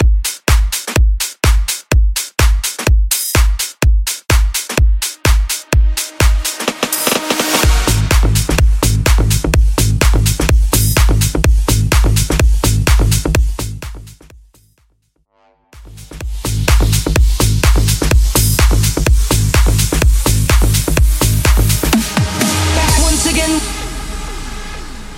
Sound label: 90s Bass House